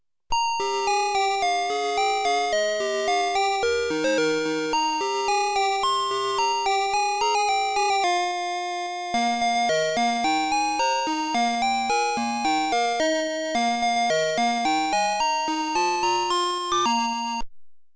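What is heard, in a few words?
Army march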